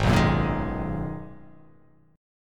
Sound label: G sharp Minor 9th G sharp; 4,2,4,3,3,3,x,x